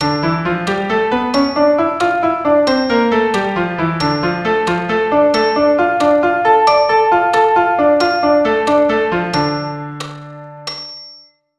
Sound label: Dm melodic